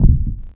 Coeur; Revista CD Expert 50

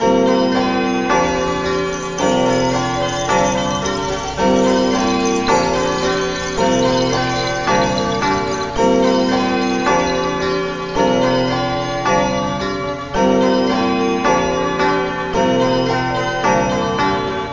Music Fond; MOBICLIC; QUIZ

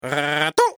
PERROQUET; MOBICLIC 72; CPLUSCLAIR

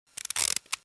METRE

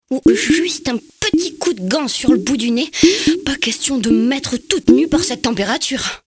bruit; MOBICLIC 69

